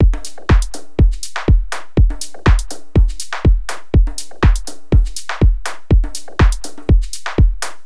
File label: Full Loop 122, Afrohouse; Afro Drums; Kits; Ghosthack; Drum Mega Bundle